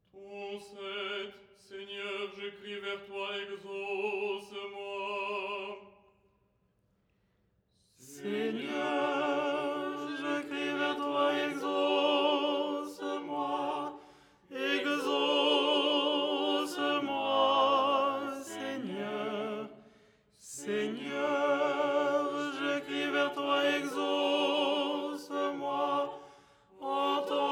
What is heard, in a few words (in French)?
tenor; " Seigneur je crie vers toi… "; Les 8 tons en français